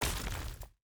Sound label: block destruction stone